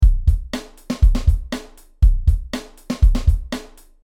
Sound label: drum; PUIG TEC2